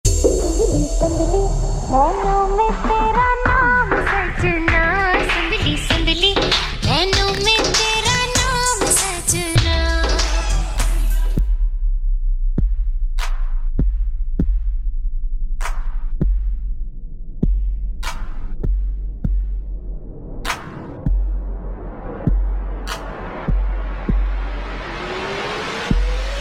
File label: trap ringtone